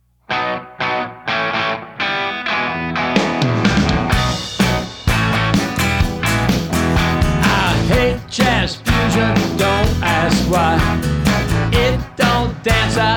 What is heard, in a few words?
Jazz Rock Club